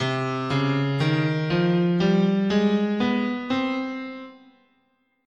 C Neapolitan Minor, scale

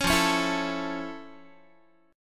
F Augmented Major 7th F Guitar; F+M7 strummed; 16,13,16,14,13,x

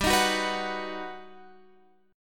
G Augmented Major 7th, Guitar, Open F; G+M7 strummed; JGuitar

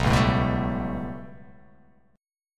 B 7th Suspended 2nd Sharp 5th down, chord; B7sus2#5 strummed; JGuitar

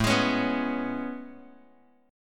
A Major 9th A, Bass Standard; AM9; strummed; JGuitar

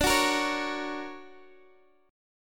D Augmented Major 7th; strummed; x,x,12,11,11,9; chord

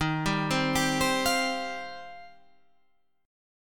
D sharp 6th Add 9th D sharp; arpeggiated; JGuitar

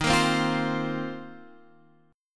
A sharp Suspended 2nd Suspended 4th D sharp

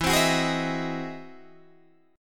E Augmented Major 9th, Guitar, Open D

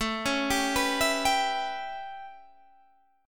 A 9th A, Guitar Open D

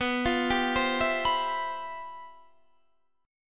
G 13th B, chord

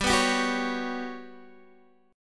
G Major 7th Flat 5th, Guitar DADGAD

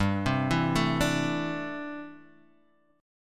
A Minor 11th G, Chord

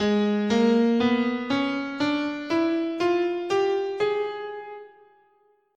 G sharp Diminished Whole Half, scale

